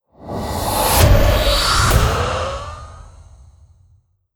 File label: Ghost Spectral Explos